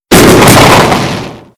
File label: fireworks spark; tf